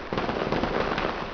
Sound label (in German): Knall